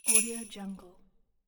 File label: sleigh bell single